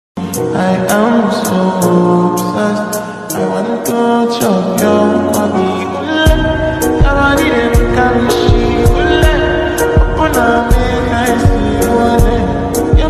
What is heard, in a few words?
slowed english ringtone